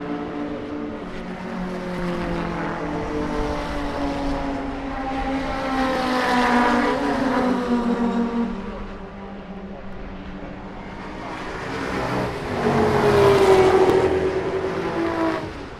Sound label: Pre 66 GTs